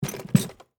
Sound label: Typewriter Down, Technology, Typewriter, Smith, Corona, Set, Down, On, Table; Typing Sound Effects; BlastWave